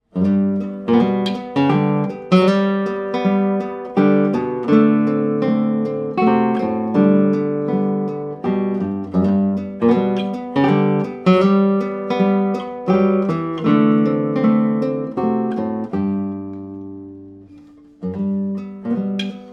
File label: Valtz, guitar; Agaudo